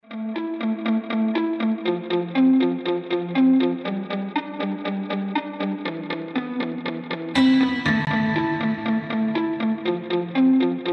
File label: ad bgm game; car